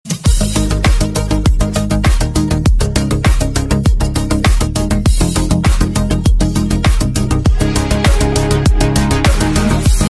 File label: instrumental